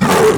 attrack horns; The Monster Plane; kolmods preatt

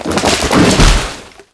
treant death; The Monster Plane; furion

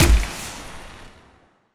Flarehand Shoot; grabpack upgraded; pp3

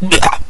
ice cube; blizzard spawn

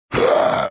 pain; skibidi toilets zombies nextbot

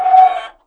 pain; zoochosis

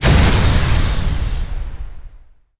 energy explode; speakerman upgraded

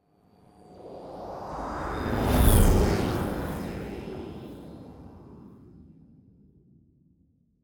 Jet, Pass By; bombardiro crocodilo; Epidemic Sound

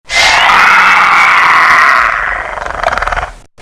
jumpscare; maraca raptors

